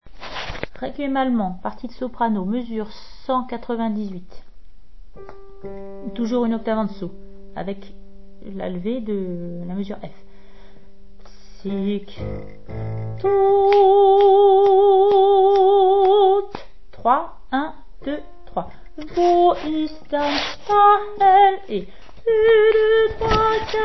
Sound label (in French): soprano moins une octave; Brahms requiem allemand; mesures 177 à 207 moins une octave